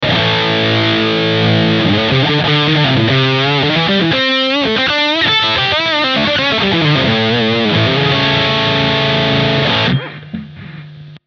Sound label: Supercharged Lead